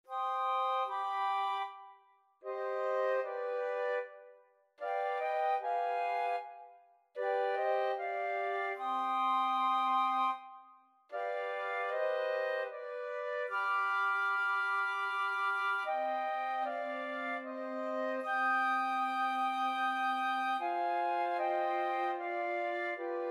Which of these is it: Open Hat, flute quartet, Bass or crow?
flute quartet